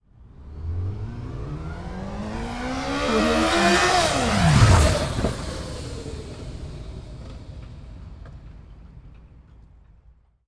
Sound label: yamaha fz8 passby; soundmorph